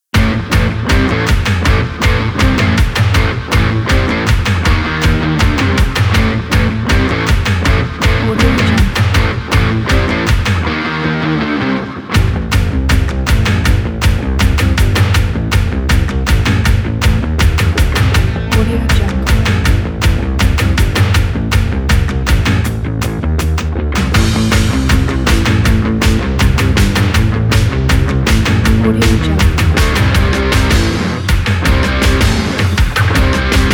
rock banger

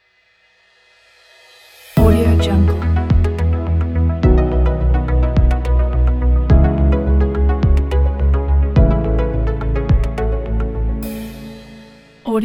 ambient tech ident

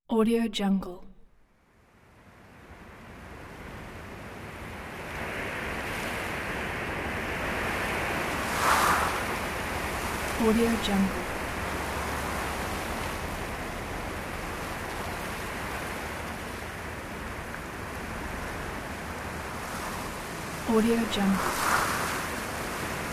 gusty wind in leaves

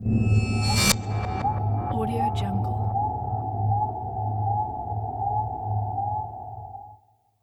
scifi horror stinger

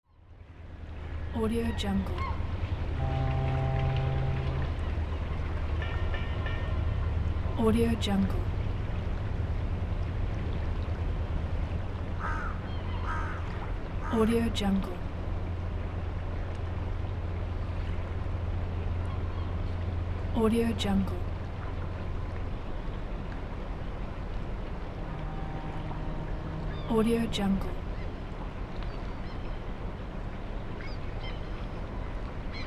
harbour ambience

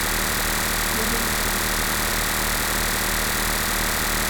scifi small power generator loop

